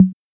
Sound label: Bongo, KorgMP7 drums